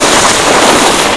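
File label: SNOW SKID; mtm2demo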